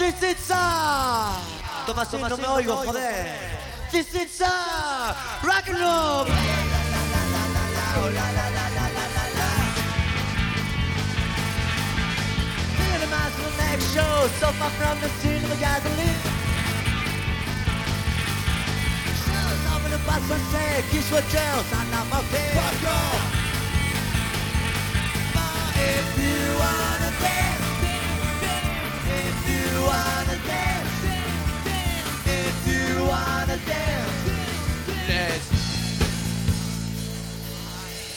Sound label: Junky Beat